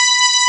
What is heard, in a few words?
ARP STRING; E-MU Formula 4000 Series Vol. 5; Protozoa; Vintage Presets